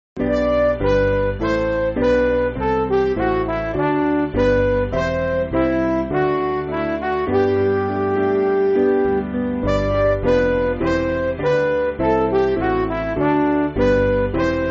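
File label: Midi, Backing Files for Churches; Royal Oak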